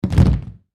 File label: Impact Body Fall Wood Floor; Wrestling and Struggling; SFX Bible